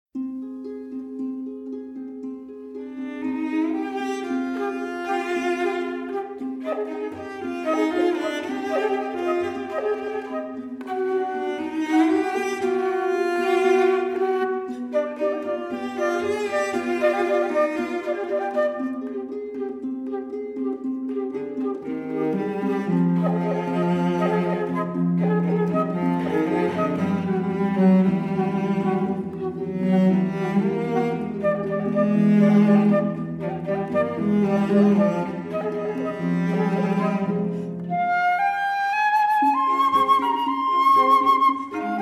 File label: Doppler Effect fl vc hp